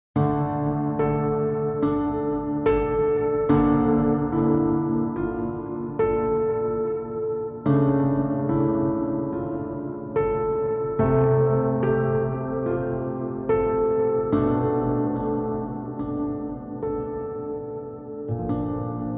Piano Only

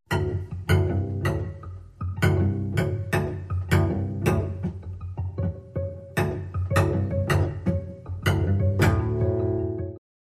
Cello Pizzicato & Harmonics

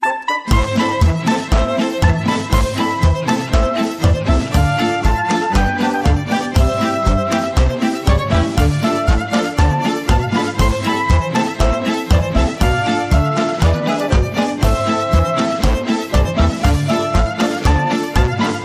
Jingle

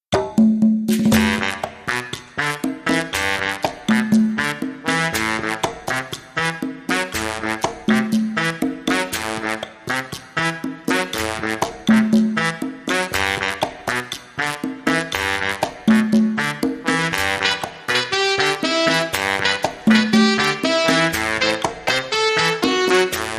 Big Band, Horns & Drums

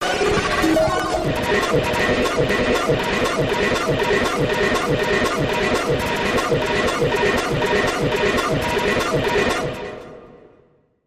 Interference Pulse

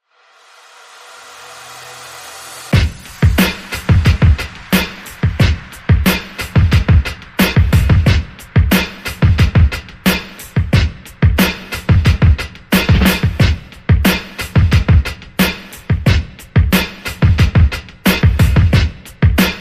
Soft Core Beat

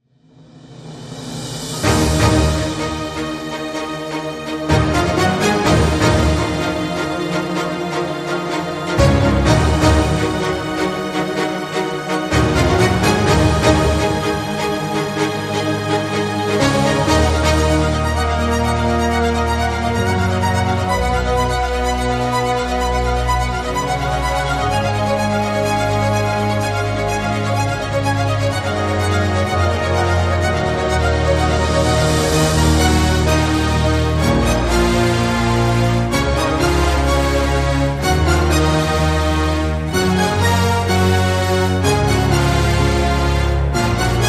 No Choir Orchestral

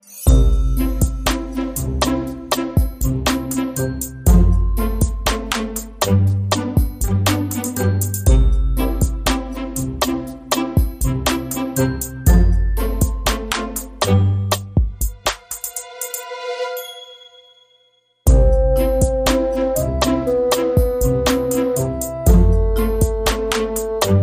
Modern Percussion

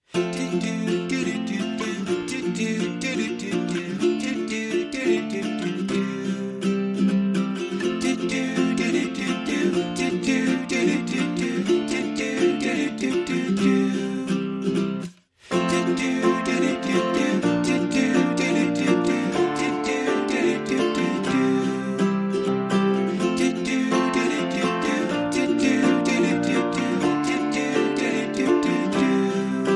No Drums No Bass

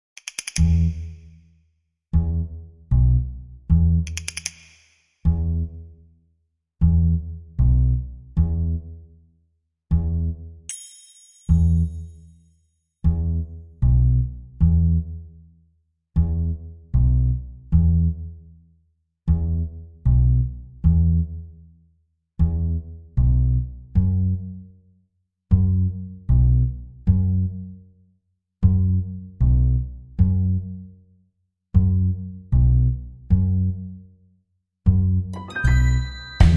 Percussion and Bass Only

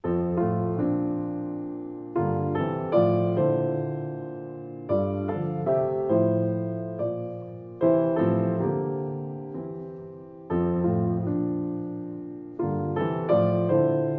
Solo Piano

